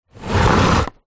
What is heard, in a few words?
foley cardboard box small drag carpet